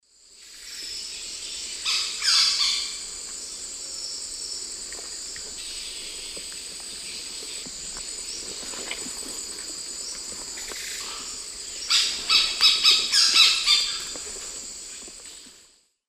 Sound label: Phoenicircus nigricollis, calls; S. America; Eastern Lowlands